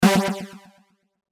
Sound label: Synth Stab Delay; Stabs (Sound Effects:Sound Design)